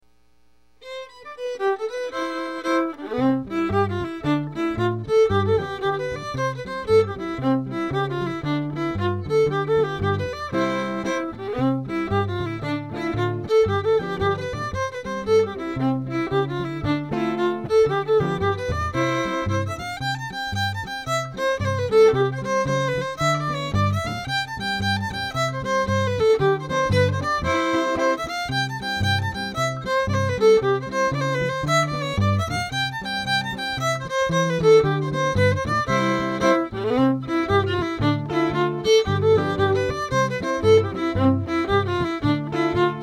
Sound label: Jig C